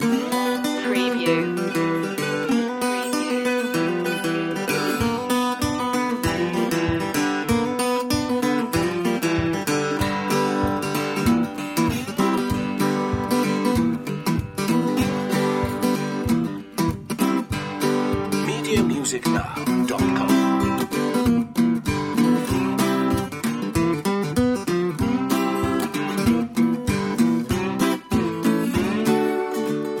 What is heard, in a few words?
blues loop